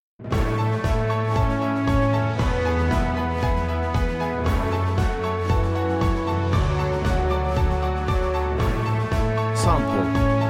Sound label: corporate